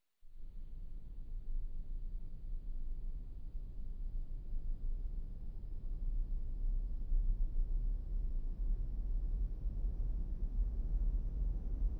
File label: Sweeps Long